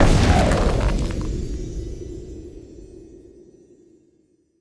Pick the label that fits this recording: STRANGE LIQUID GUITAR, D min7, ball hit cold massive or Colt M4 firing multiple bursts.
ball hit cold massive